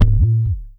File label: WD BEND, DRM HITS; Spectrasonics; Liquid Grooves; WAVDRM HITS1